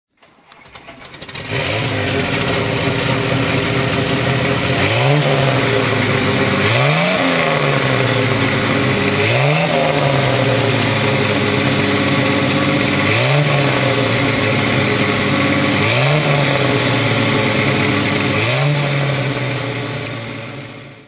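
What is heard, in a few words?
alfa 6c 2500 throttle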